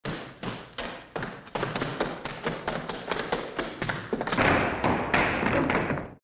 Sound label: Running across the floor and steps in the distance